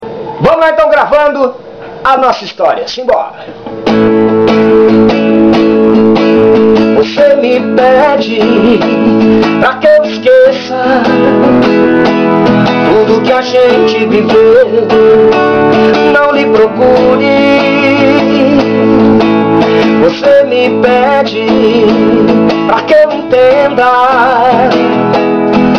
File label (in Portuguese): ritmo pop rock universitario